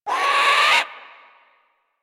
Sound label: Bird Of Prey Vocal Scared D; Warframe